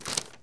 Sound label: Paper Crinkle Rustle; SFX Kit; Foley - Misc